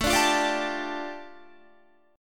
A 7th Suspended 4th Sharp 5th, A, down, Guitar

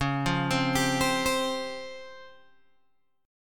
C sharp Major 7th Flat 5th Guitar Open G; down; chord shape

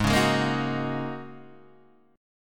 Ab 9th Suspended 4th Ab down Guitar Open D

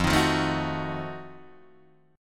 F 7th Flat 9th, Guitar Open D

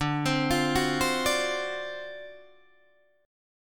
D 7th Sharp 9th D down Guitar Open D

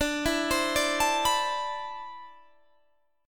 D 13th Suspended 2nd D

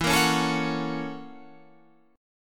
F sharp 7th Sharp 9th, E

